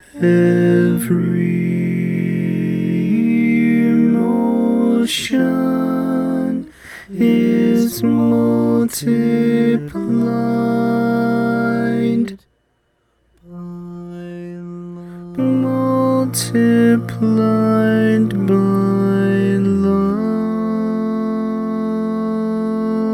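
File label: Baritone, barbershop tags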